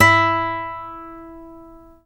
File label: Nylon Guitar; GTR E3 HARD; E-MU Producer Series Vol. 8 – Platinum Phatt